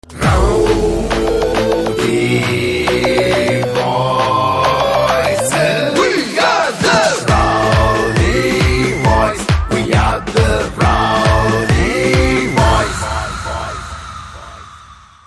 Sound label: Theme Ringtone; Rowdy Boys